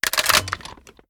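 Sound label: sniper reload empty bolt open; gmod; iw8; alpha50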